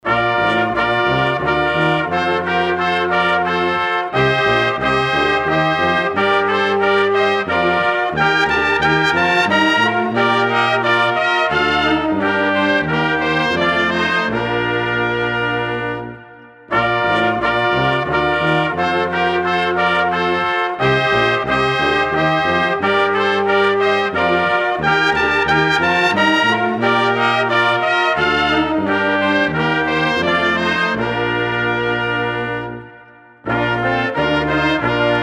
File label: Instrumental brass; Moldovita; H Purcell